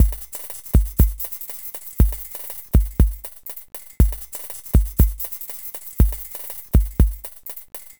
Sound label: Hats Kick Beat, Electronic Beats; Sonic Foundry (Sony Creative Software); Myths of Technology